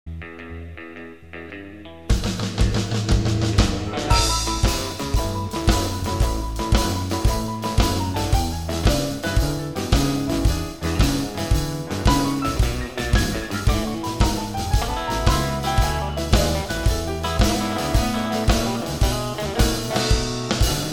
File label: Medium Texas Shuffle 12 bar Guitar Piano Rhythm